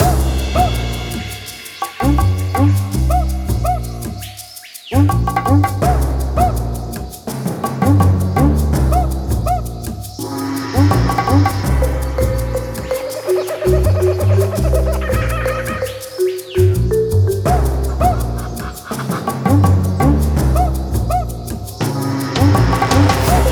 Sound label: Ingame Ancient Loop